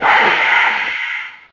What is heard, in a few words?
skeleton pain; custom monsters; evoskeleton